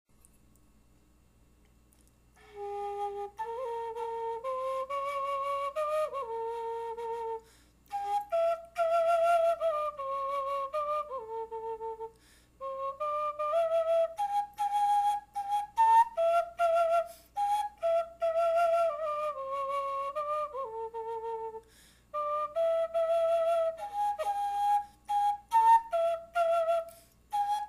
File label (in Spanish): flauta dulce